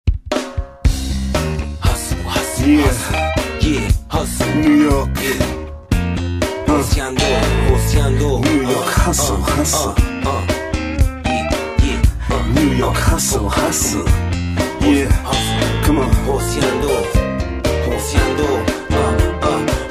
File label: rap